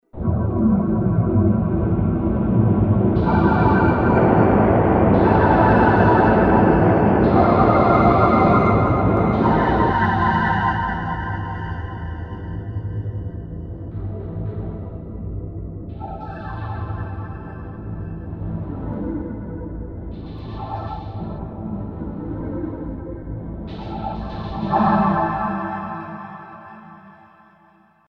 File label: Ambient: Hellbound voice, Emu Xtreme Lead-1